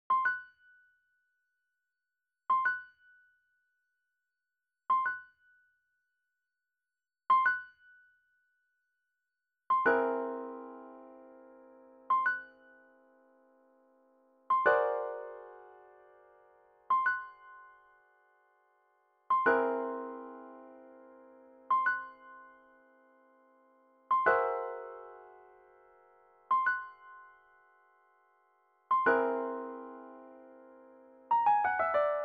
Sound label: Whole Tone Scale, piano; Rainfall